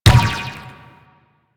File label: Ball Bounce Rock; Warframe; Missions; Tenno Ball